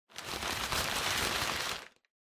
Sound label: Packing Paper Rustle; 梱包紙01-04(ガサガサ); 効果音＞梱包材